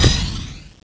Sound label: shoot, paintball